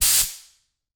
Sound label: Industry Tool Air Escaping From Air Compressor Quick Burst